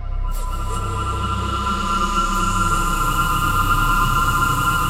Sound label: ALIEN SWING; Pro-Rec - Synthsonic